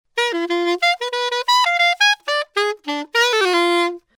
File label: Soprano, saxophone; Signature